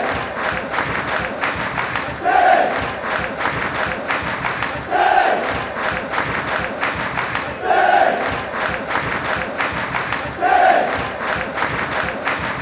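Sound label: city clap, football songs and soccer chants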